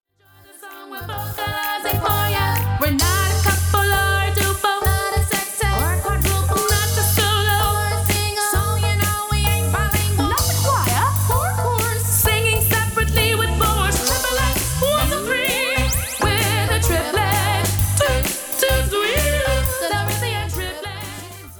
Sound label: Triplets